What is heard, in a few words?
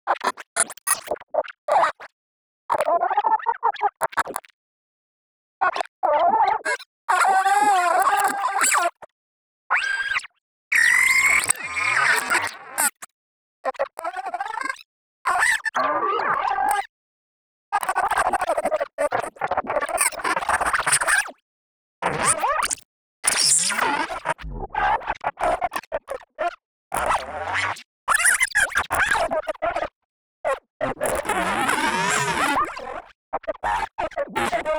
Stem Scanned Synth fx